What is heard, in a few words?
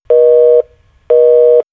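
Short Tones, electronic fx; X-Static Goldmine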